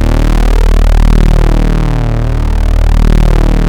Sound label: OB D#2 -R, Rare Synthesizer; Hollywood Edge - Giorgio Moroder Rare Synthesizer Collection; OB 4 VOX.